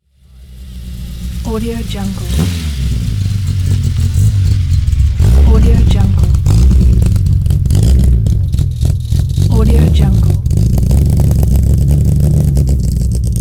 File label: rally car small revving small acceleration slow passby